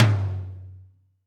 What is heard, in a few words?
Tom Lo Power, Alesis D4